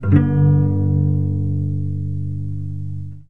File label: plucked chord, cello